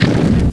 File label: Giant Mutant Move; Playable Tutorial Level; TR1